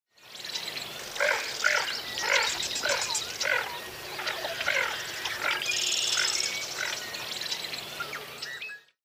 Lesser Scaup Aythya affinis

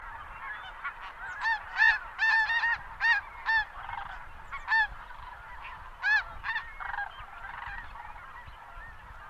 Snow Goose Anser caerulescens

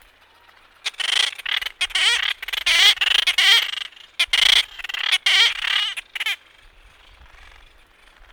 Black Noddy Anous minutus minutus

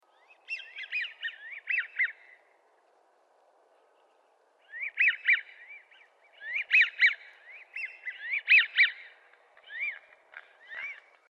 American Wigeon Mareca americana

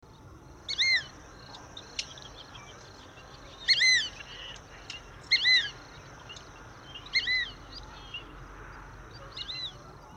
Fulvous Whistling Duck Dendrocygna bicolor

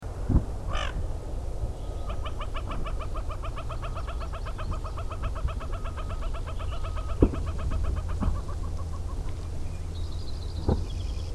Bufflehead Bucephala albeola

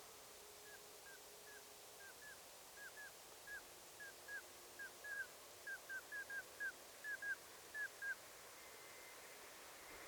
Common Scoter Melanitta nigra

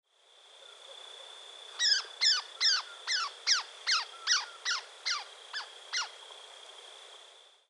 Elf Owl alarm; Chino Canyon; J.Bradley

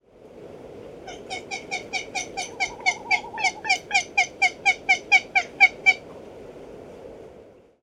Great Frigatebird Fregata minor palmerstoni

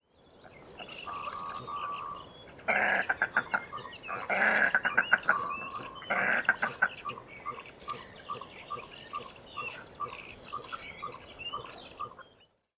Common Merganser Mergus merganser

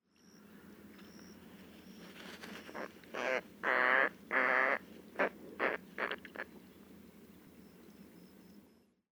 Roseate Spoonbill Platalea ajaja

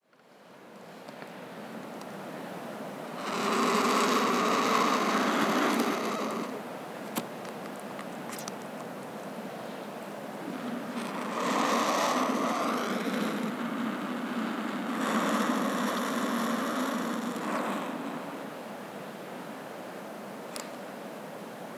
Turkey Vulture Cathartes aura

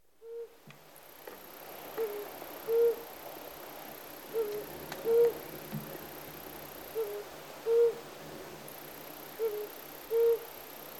Flammulated Owl Psiloscops flammeolus

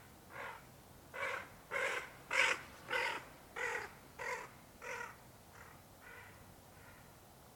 Tufted Duck Aythya fuligula

